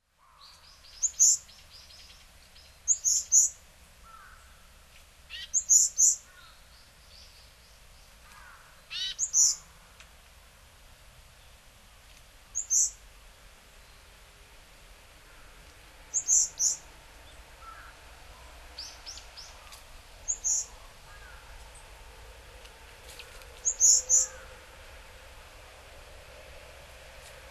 yamagara, hyogo

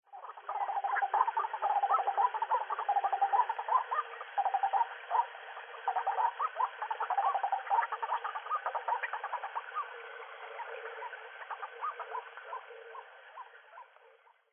shiroharakuina; ishigaki

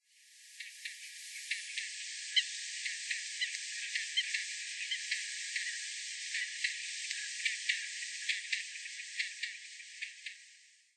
kanmurikaitsu, ogawara

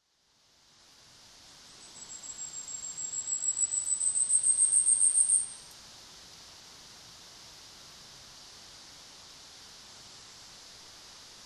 yabusame, tanzawa

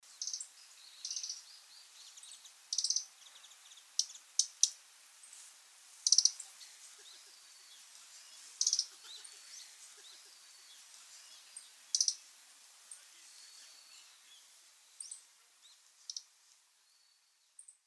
nishiojiro

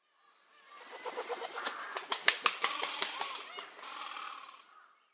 karasubatoh, sakata, koe

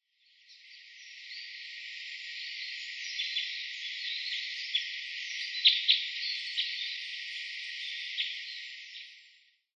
isuka, nasushio, koe

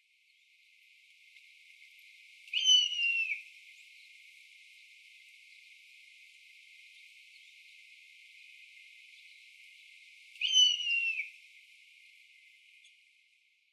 nosuri; muroran

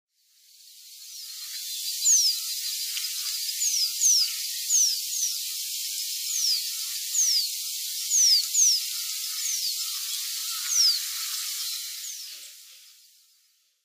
tsurisugara; saga

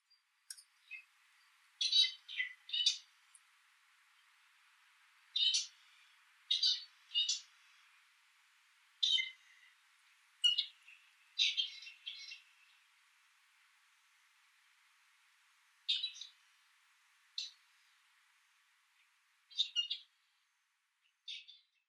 ochu; nagasaki

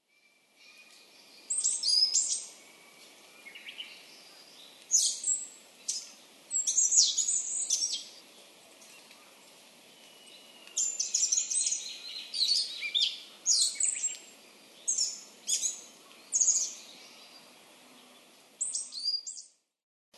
kosame, nasushio, koe

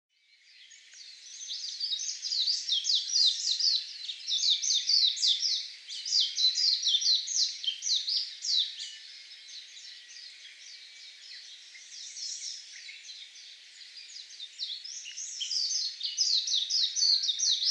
mejiro; koe

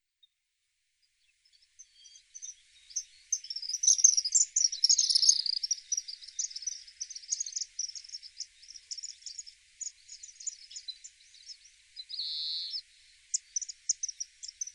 harioamatsubame, tsuruoka, nakigoe

